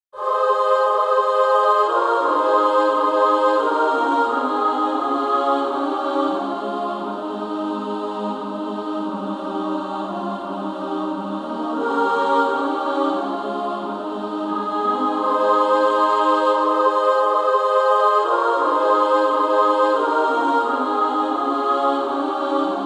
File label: away in a manger SSA